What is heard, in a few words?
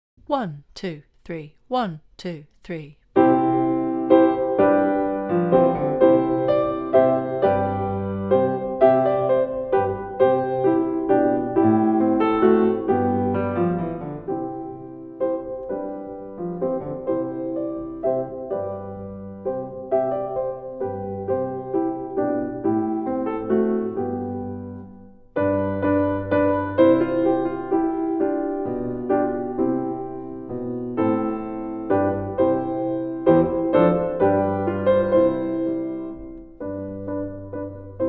Trumpet Tune backing